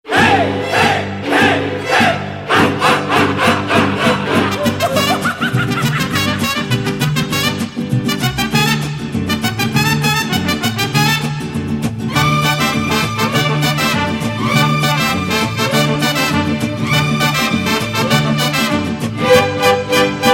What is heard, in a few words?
Mariachi